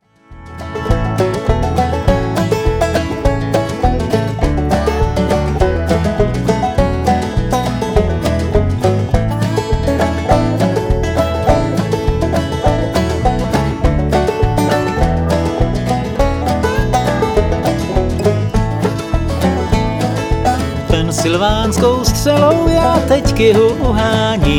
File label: banjo